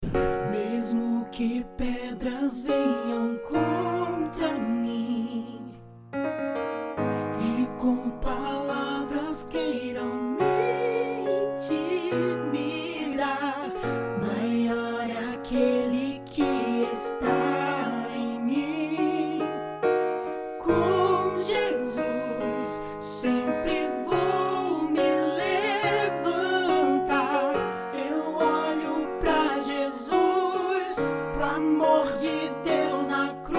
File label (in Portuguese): contralto